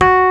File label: RHODES F4; E-MU Formula 4000 Series Vol. 3; Analog Odyssey; VK Instruments